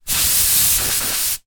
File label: industrial compressed air aerosol spray burst x1 against object; zapsplat